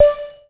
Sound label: map click; IGO; android